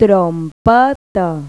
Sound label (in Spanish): TROMPETA; Pipo-Aprende musica con Pipo